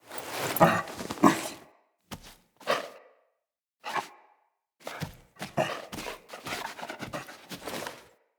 Quest Wolf Cub Rescued; Animals; Wolf Cubs; Rescue Cins; Orengal

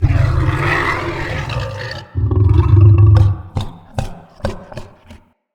Behind Wall Attack Growl Warning; Vocals; Bromius; Animals

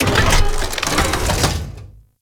Vendor Cart Close; Solaris United; Warframe; Venus Open World

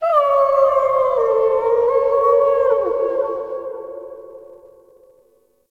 Night Distant Coyote; Warframe; Eidolon Plains; Ambience

